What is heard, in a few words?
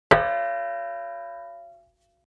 impact metal plate hit ring stopped soft